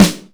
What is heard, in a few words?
SD AMBI 1, Korg EMX-1, drum machines